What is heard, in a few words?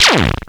Percussive, Drums Perc One Shots; Sonic Foundry (Sony Creative Software)